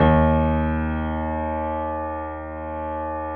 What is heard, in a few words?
D#1, GRAND P; USB Soundscan vol.17